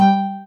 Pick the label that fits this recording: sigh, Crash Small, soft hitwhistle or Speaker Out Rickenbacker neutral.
soft hitwhistle